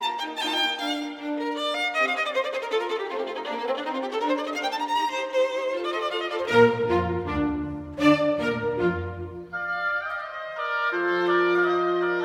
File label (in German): violinkonzert nr 1 b dur kv 207 presto